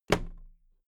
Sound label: toolbox close drop lid soft